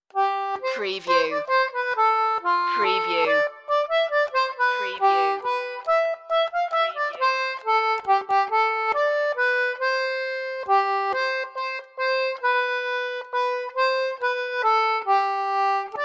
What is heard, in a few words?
we wish you a merry xmas solo concertina